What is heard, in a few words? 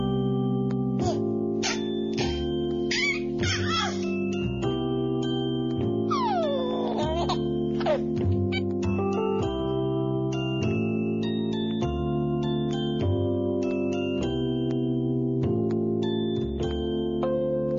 baby rhyme, children